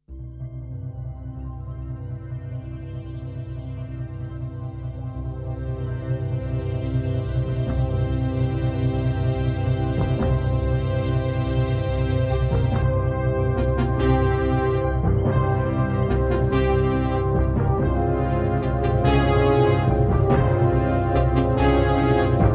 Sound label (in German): Industriefilm